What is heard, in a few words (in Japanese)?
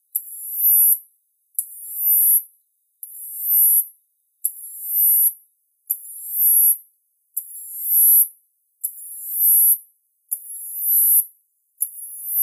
hayashino; コオロギ; ハヤシノウマオイ